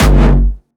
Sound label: Jumpstyle Kick G1, vengeance essential bassdrums; vengeance essential club sounds-3; VEC3 Bassdrums